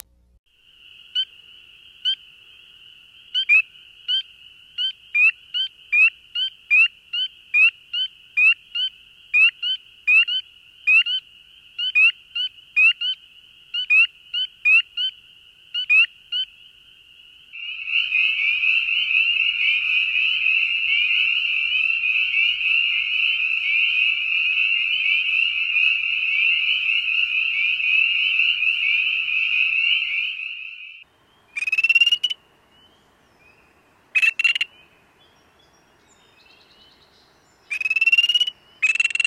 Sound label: Spring Peeper Pseudoacris Crucifer